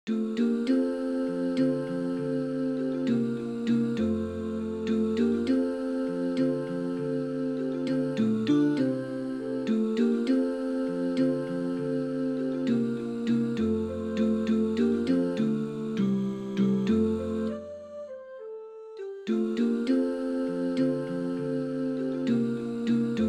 Sithi Shwele tenor

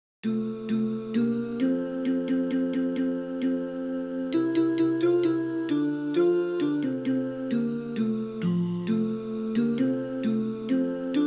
Down to the river tenors

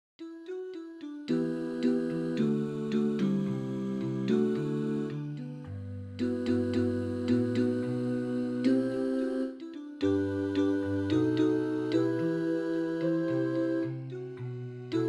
low harmony

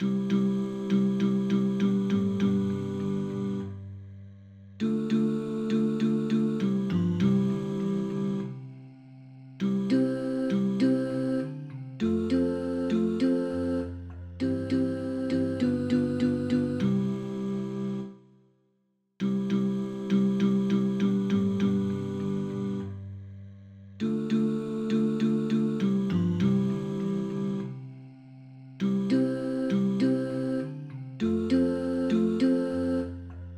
Amen Siakadumisa tenor

